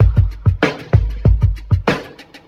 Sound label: Drum loops, Boombap